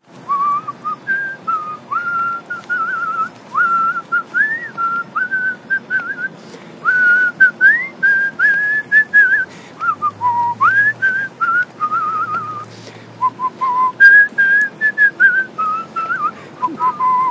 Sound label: Whistling melody